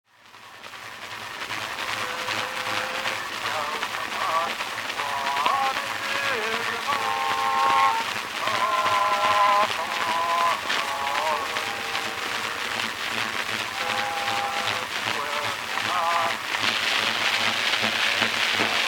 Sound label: Male and female vocal duet of Suwanee River Old folks at home; Cylinder Preservation and Digitization Project